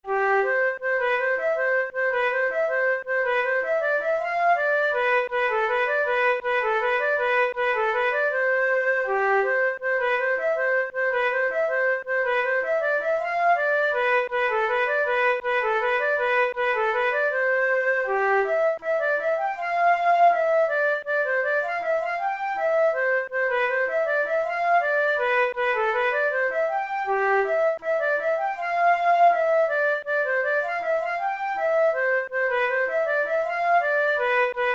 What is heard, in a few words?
valse, flute; brezon